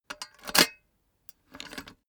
cash box metal unlock; soundpool

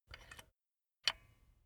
silicone gun bullet lock mechanism soft; berufe; handwerker; soundpool

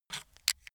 makeup box pick up, badezimmer; haus-garten

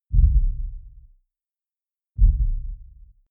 sub impact short distant rumble

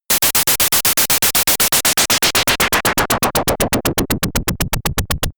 noisy blades; swooshes-swishes

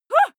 female shout surprised short; schreie; menschen

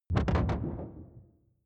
sci-fi alien wobble slow; swooshes-swishes

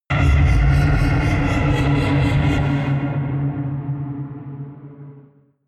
flanger flutter high; whooshes rises; hits impacts

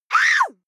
female lunatic scream hysterical short; schreie; menschen

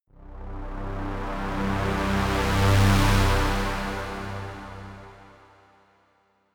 drone heavy saw bass swell short

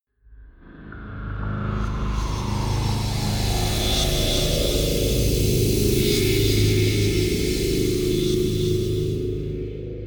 hiss hum drone, horror; atmosphaeren umwelt

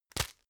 tissue grab hard; objekte; taschentuch

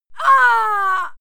female fight groan pain; schreie; menschen

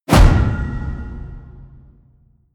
promo pad; whooshes rises; hits impacts

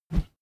whoosh bamboo slow

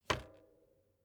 bicycle street kickstand hinge down soft; fahrrad; verkehr transport

